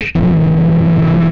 Hydraulic; retro arcade

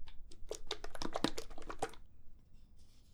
Bottle Shake; Bottle Plops

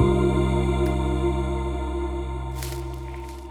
Vocal Mist, Pads

